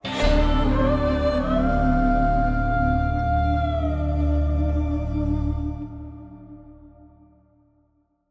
Eastern Death Stinger Short; H-Hour World's Elite

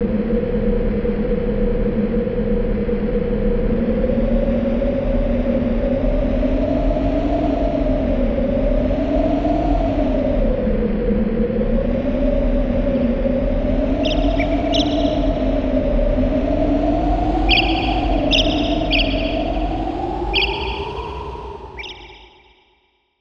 Title Screen Winds

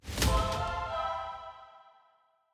Levelup; Eins Sword; Windows

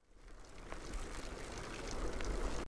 bicycle loop; The Sims 3; Windows

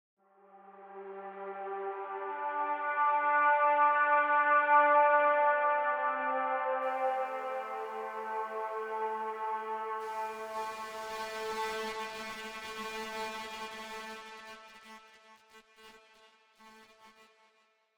Horns; the swapper

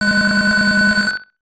Hit; Hisshou 777 Fighter; SNES; Trianof

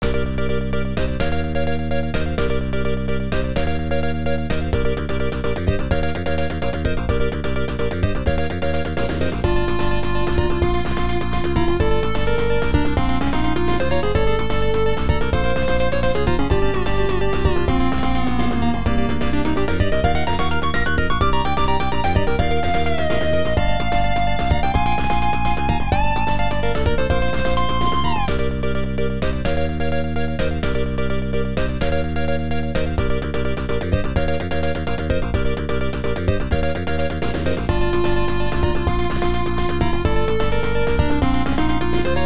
Tension; Black Bird Tori Tachi no Tooboe; PC-9801

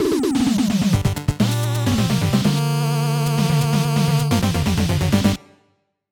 Scene Clear; Teenage Mutant Ninja Turtles 3 The Manhattan Project; NES

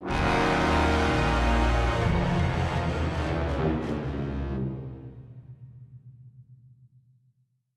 Failed Scare Stinger; little big planet playstation vita

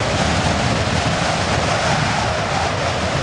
Wind Rushing Loop; Lucius III

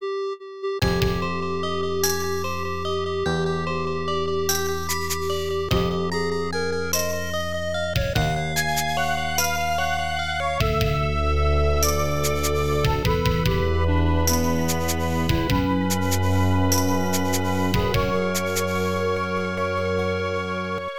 Round 2 Clear; metal black taito f1 system arcade

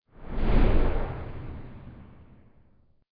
Whoosh; Learn to Fly 2; Pacifist

